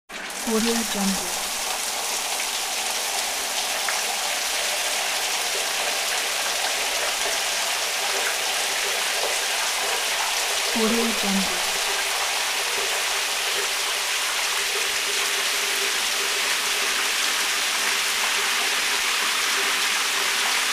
water stream

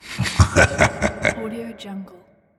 evil laugh

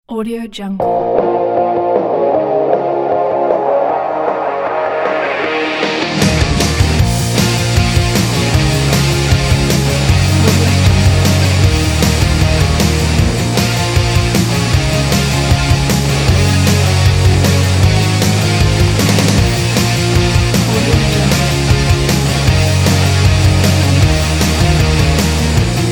rock

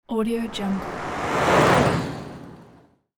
car passing

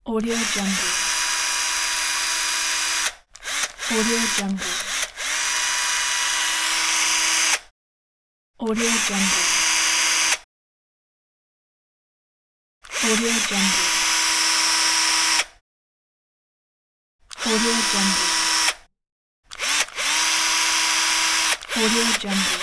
electric screwdriver

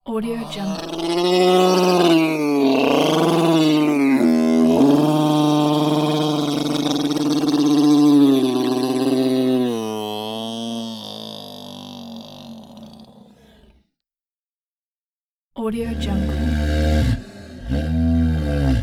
growling monster

